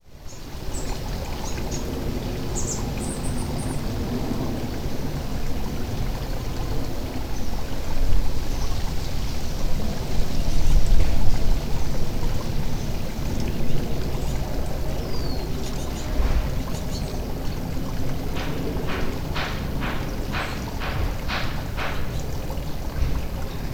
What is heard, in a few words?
backyard